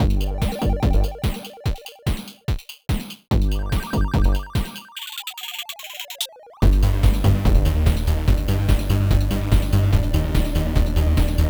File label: 8BIT; lego the lord of the rings 2012 gamerip wii ps3 x360